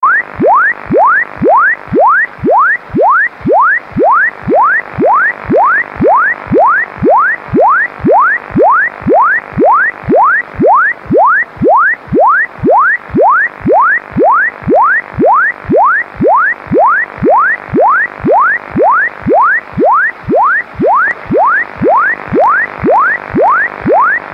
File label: Sweeping Noise 9330khz USB